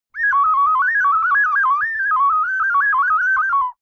ocarina randomness short

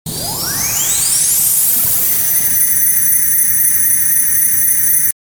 ambient event

